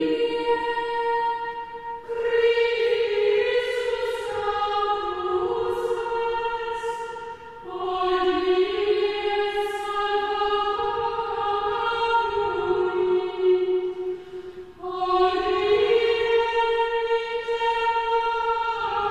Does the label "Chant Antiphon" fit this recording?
Yes